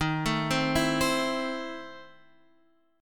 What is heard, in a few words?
E Major 7th Suspended 4th Sharp 5th, D sharp